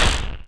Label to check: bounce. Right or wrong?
Right